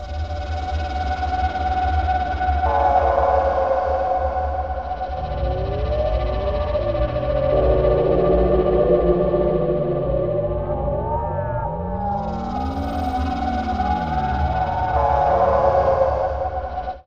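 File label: ambient, alien ambi; Best Service ProSamples vol.36; Chillout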